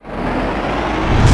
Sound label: item charge fast; tf2